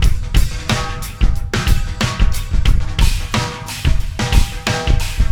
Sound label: Landing Party Beat, Processed Drumkits